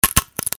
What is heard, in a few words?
wood crackling breaks